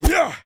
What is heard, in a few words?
Big Wig Attack